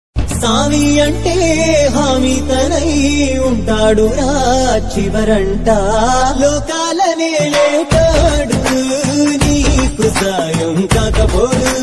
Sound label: telugu song bgm ringtone